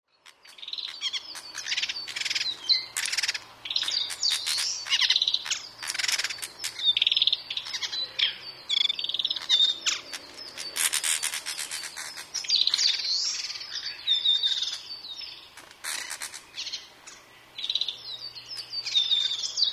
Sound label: kibitaki; キビタキ; 地鳴き(争いの声); 2009年5月10日 静岡県富士宮市